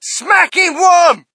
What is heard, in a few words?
crowd, shout; Mike Tyson Heavyweight Boxing